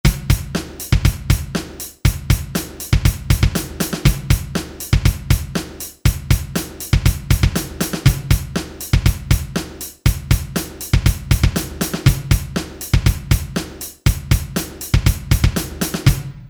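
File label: Heavy Drum Pattern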